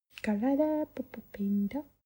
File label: woman sing ethnic